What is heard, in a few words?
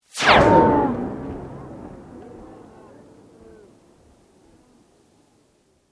Sweep Medium; Created sweeps, swooshes and other movement effects; Sweepers (Sound Effects:Sound Design)